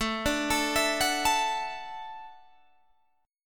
D-Major Add 9th A down Guitar Standard; arpeggiated; JGuitar

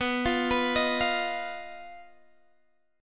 B Major Flat 5th B Guitar Open G

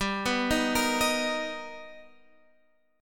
B Augmented Major 7th G, chord

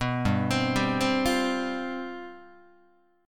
G 11th, Guitar; G11 arpeggiated; JGuitar

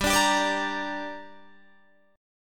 G Minor G down Guitar Open D, chord

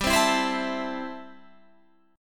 A Minor G, guitar

